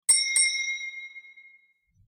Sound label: Church bells